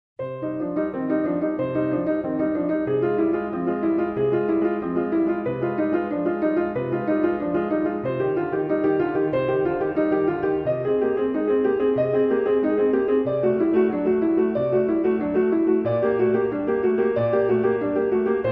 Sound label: prelude fugue 2 c minor; bach